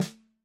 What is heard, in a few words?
snare; soundscrate